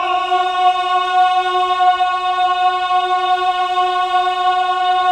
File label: MEN AH, Choir of Ahs; Roland - LA Composer vol. 1